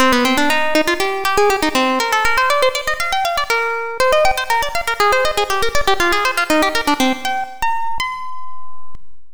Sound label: plucked